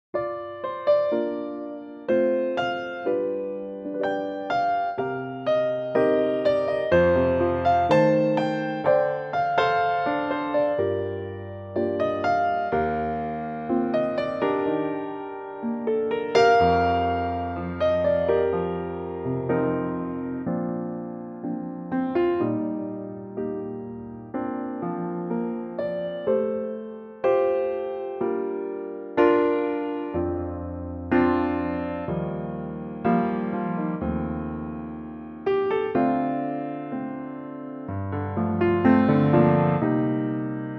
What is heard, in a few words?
Bb piano; Pianotrak; key - Bb (Db for the chorus); range - C to Eb; Very lovely piano only arrangement